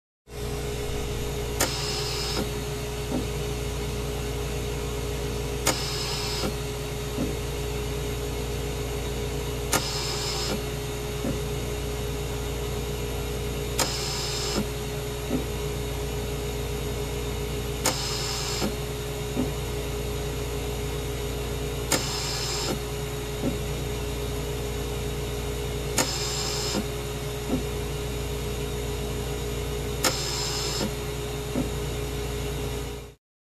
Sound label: Medical Device operating valves